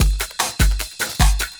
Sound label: LOOP, Drum & Bass, 150 BPM